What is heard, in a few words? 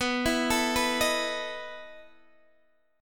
E Major 7th Suspended 4th, B